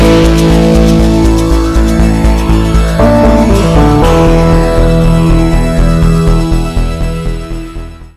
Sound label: Mission Passed; GTA